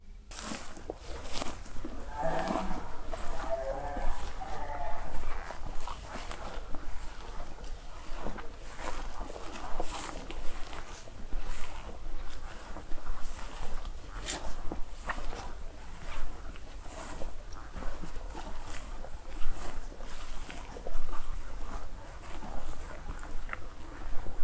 erdőben gyalogolva, gímszarvas; termeszetben

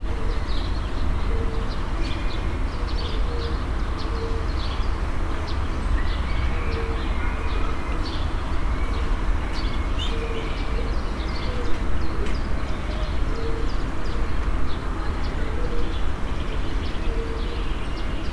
pávák az oroszlánkifutó mellől; állathangok; nyíregyházi zoo 2010

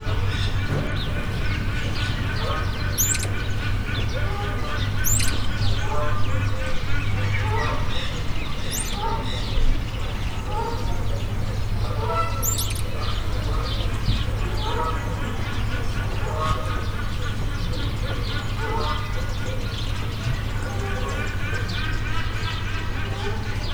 bolíviai mókusmajom; állathangok; nyíregyházi zoo 2010

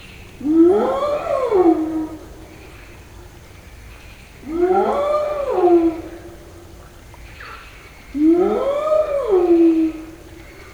oldalról, éjszaka, foltos hiéna; jaszberenyi zoo 2015

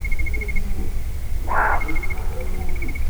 halk, európai őz; termeszetben

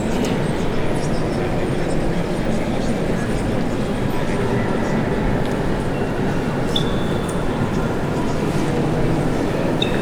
alaphangok a katedrálisban

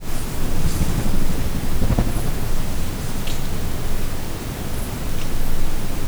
erdő patkósdenevérek, denevérek repülés; termeszetben

